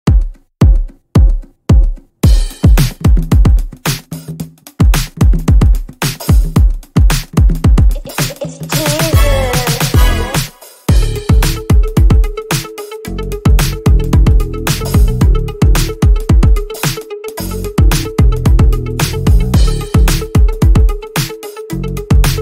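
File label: Instrumental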